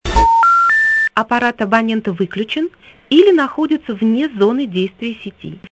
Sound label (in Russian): рингтон смс